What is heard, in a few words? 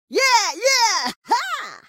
game over action; Hank